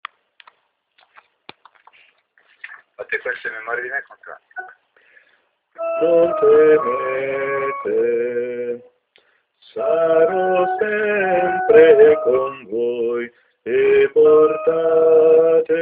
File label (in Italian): contralti voce